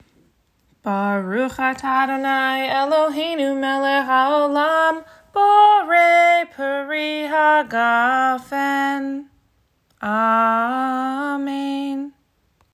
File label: Ha Gafen